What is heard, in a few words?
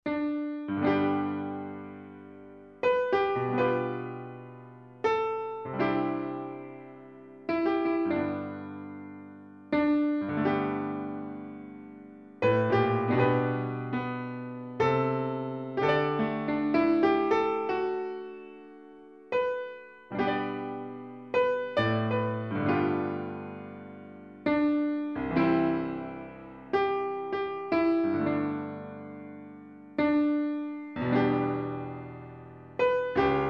Piano Amazing grace